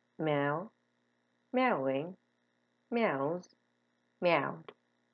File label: meow